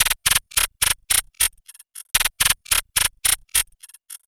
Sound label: Micromachine Beat, Electronic Beats; Sonic Foundry (Sony Creative Software); Myths of Technology